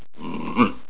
boar sight; Giant Boar

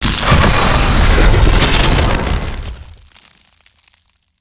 Charge Attack Vox FLAME; Evolve; Meteor Goliath

